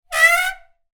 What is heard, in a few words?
transport bicycle brake squeak loud; zapsplat